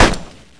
30 cal; WAR

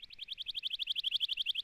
warbler

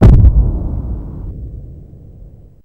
avre shot low end; WAR

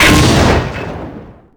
sherman destroy main gun; WAR

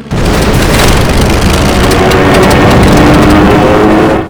henschel crash; WAR

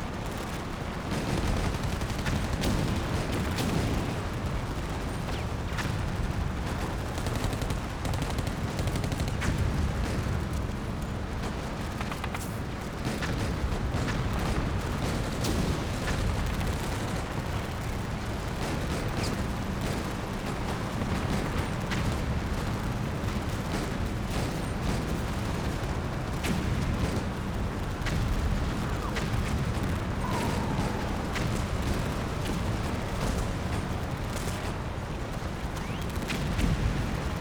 battle; WAR

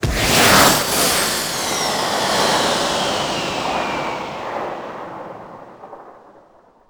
nebelwerfer wet; WAR

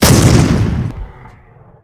20mm fire; WAR

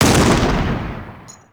axis anti air; WAR

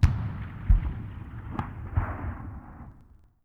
distant gun; WAR

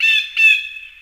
blue jay; WAR

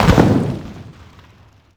medium impact generic; WAR

